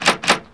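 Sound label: door latch locked; The Elder Scrolls III - Morrowind; Morrowind GOTY GOG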